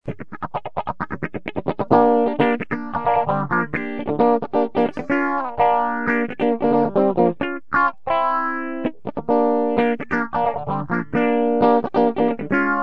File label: bridge fazer